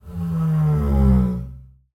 string double bass bowed harmonic low slide whale call; Sonniss GDC